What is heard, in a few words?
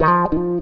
FUNKY, Grooves